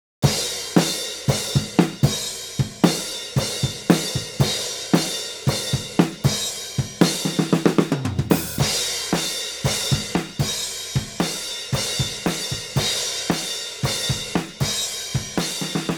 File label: drums discret before after